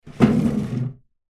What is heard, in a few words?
Chair Slide Tile Floor; Foley